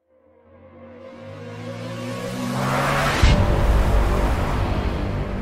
Epic Electronic Modern Action Aggressive Powerful Choir